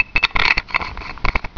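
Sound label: barrel change; Digital Paintball 2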